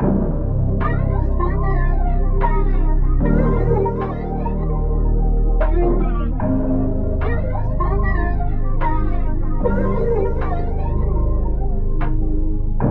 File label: 150 BPM C Min, Melody; RnB; Cymatics; Cymatics - 2022 Melody Collection - RnB Pt 2